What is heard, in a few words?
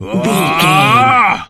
voice male battle shout